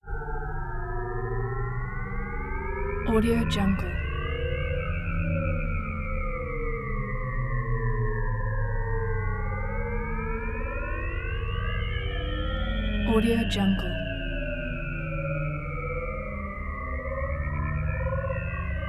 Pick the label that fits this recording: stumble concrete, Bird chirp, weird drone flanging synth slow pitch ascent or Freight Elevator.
weird drone flanging synth slow pitch ascent